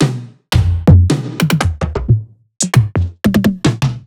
Two Shots Tom; Sliced

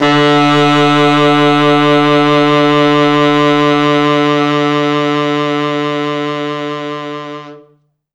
T Sax, d2

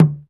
UGANDA DRM, UGANDAN

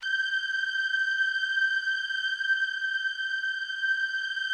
G5, STRINGS, Yamaha VP-1; Music Store; JODYSTRINGS

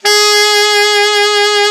ALTSAX D3; Best Service; Alpine Volksmusik

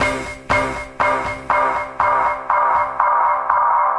Jazz Noise

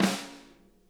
snare rim, drum kits

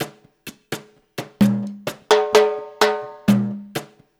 LOOP, DANZON, Percussion; InVision Interactive; Percussion Slam

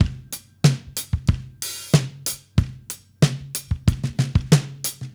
drums fill, analog funk; Mixed Berry Jam; Sonic Foundry (Sony Creative Software) - What it Is! 70s Analog Funk

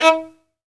Synclavier Strings, SOLO VI; ILIO

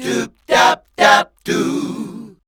Voice Spectral, H PHRS

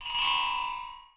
SPINNIN, METALLIC FX; Voice Crystal; Steve Reid Definitive Percussion

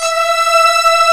SYN BRS & CHOR

